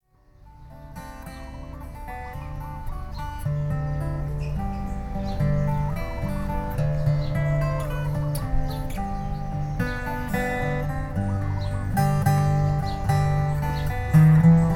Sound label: kihoalu birds